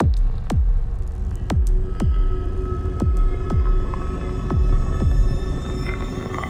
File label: countdown 120bpm intro; Doom 2016